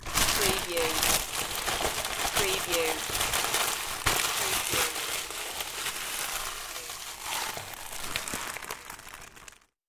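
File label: Wrapping Paper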